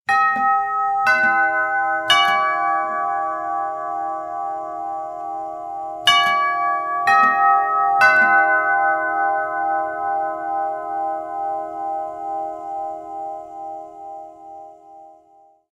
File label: door bell westminster chime door bell ring bells; comic noisemakers door bells